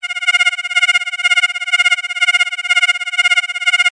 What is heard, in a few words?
cartoon scanner